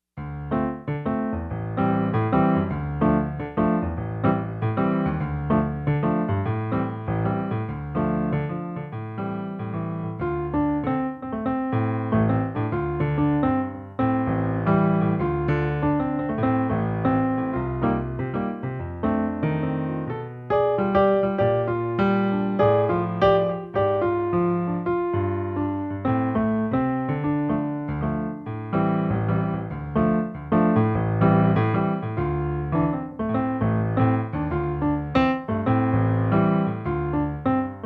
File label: blues in d minor